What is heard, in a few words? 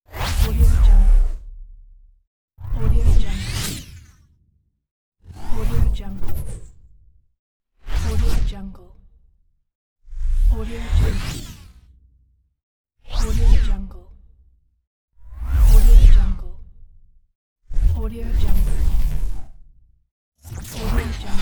technological whoosh pack